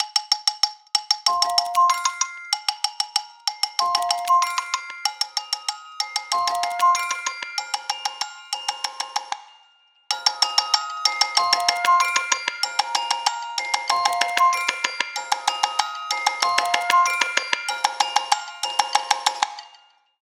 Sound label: Songbird; Google; Google Sounds